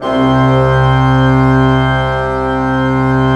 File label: PLENO C2, Cathedral Organ; ILIO; Propeller Islands